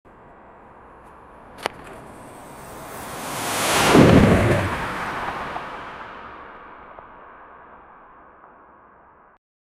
space station crashing

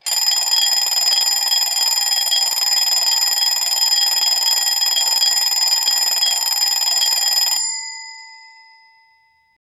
old mechanical alarm clock

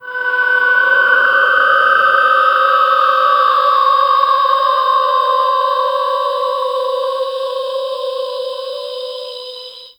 magical

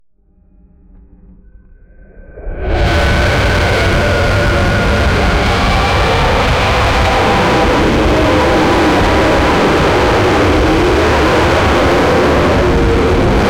the air tightens pressure, kaiju

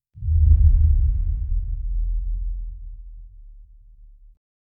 slow whoosh low thump; motion

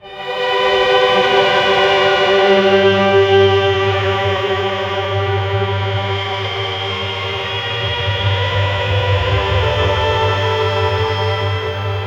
generate horror sounds of, orchestra

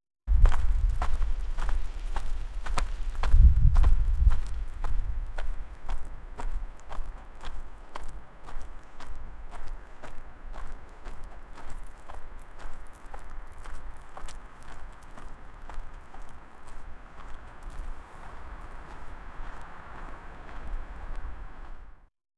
realistic the sound of ti, field recording

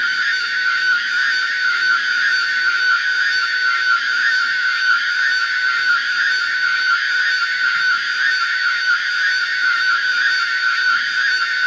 light futuristic cyberpunk

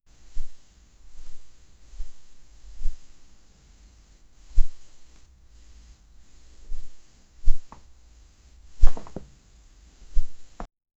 the sound of fabric stret; materials

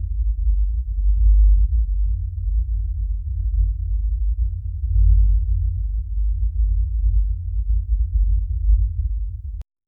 low ambient prison hum

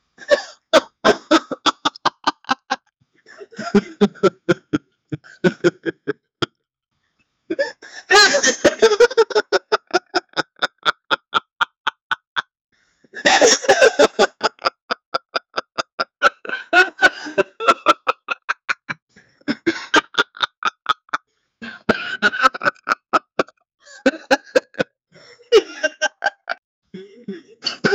sound effect of a man, giggling

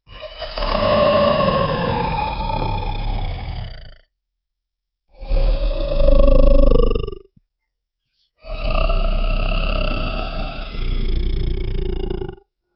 demonic creature sound ro; frightening

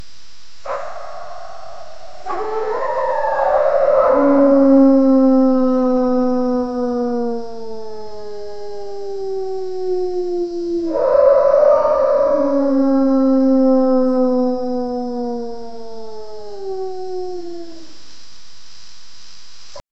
four evil dogs howl; ghostly

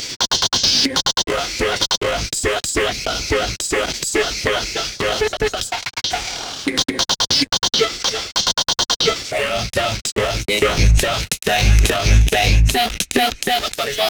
breakcore glitches ragga, breakcore

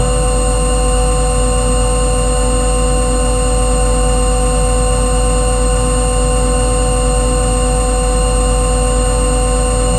soft electrical hum of gl

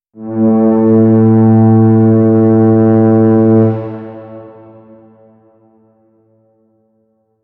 a very large deep ship, misty

